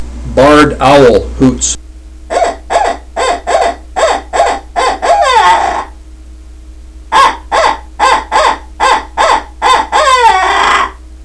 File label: owl; dixie